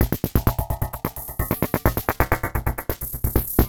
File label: Beat, Industrial